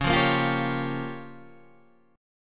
Db 6th Flat 5th Db, down, Guitar DADGAD

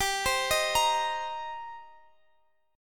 D sharp 6th, G

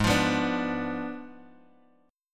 Ab Diminished 7th Ab Guitar DADGAD; 6,8,9,7,x,x

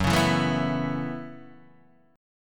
Gb Minor 11th Gb Guitar Open D

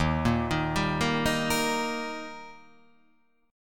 D sharp Major D sharp, chord; x,6,8,8,7,5,5,7; chordshape

